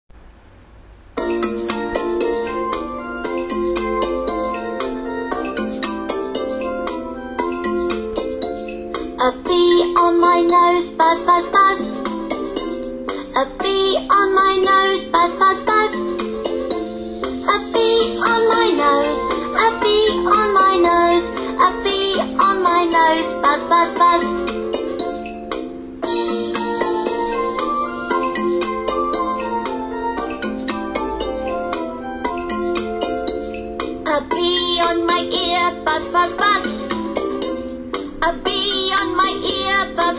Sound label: a bee song